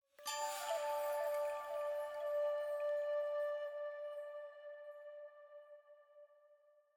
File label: item Jingle Flute + Chime D